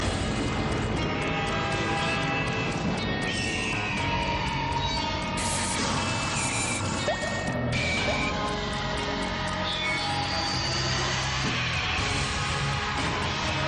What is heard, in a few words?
Title Music; Aahat 4